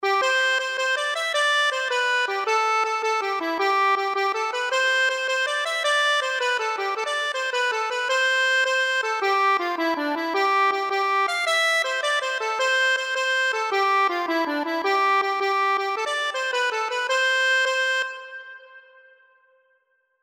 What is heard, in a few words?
accordion